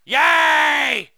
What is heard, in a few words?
yaaay; DBZ Abridged